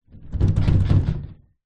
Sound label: household wardbrobe shake wobble